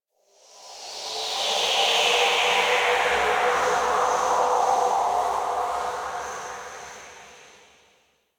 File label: Exterior Phases, Ambience; Warframe; Rail Jack